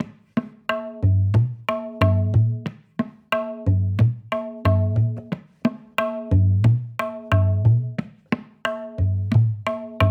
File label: Tabla 90bpm A